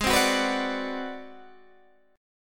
G sharp 7th Sharp 9th Flat 5th G sharp down Guitar DADGAD, chord